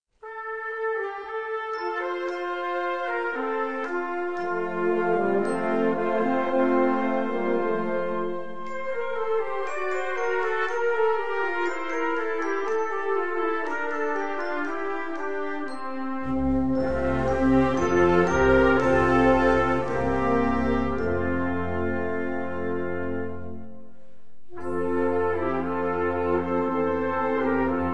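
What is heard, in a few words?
Fantasy on French Christmas Songs, Band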